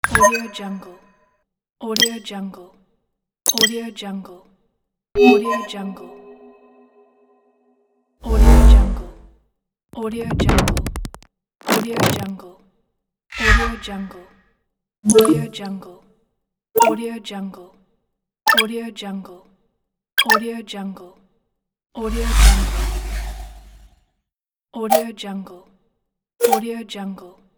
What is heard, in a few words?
hud sfx; giovanni-studio